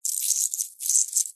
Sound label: industrial chain small light movement short